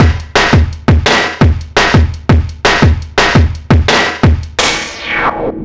smithy swell, Drum Bass Drumloops 170bpm; Zero-G; Total Drum Bass

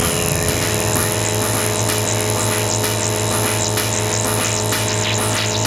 Noises off, Synchro Funk, Beats; Sonic Foundry (Sony Creative Software)

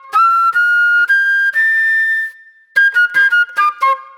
Disco Flute

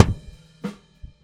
BAM bapride, Kicks; Airwindows

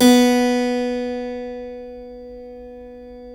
B 2 CLAV; Keyboards Acoustic; AKAI; USB Soundscan vol.17

